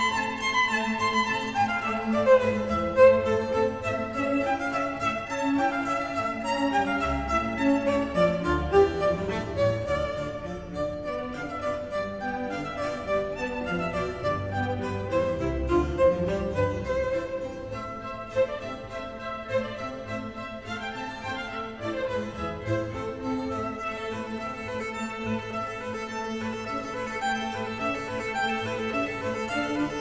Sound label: classical